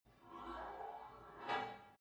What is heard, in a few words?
take off weight barbell reverby, fitnessstudio